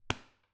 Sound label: Punching